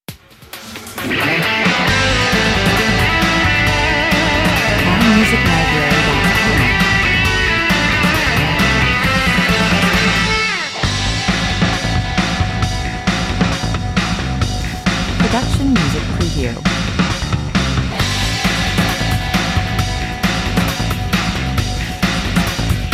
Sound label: energy show 134 bpm